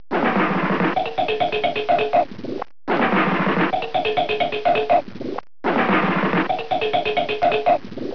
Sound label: bang pop